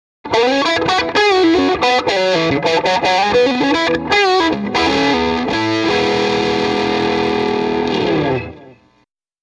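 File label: m75 lp mid pickup jtm45 straight in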